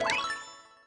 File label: slide bubble burst right answer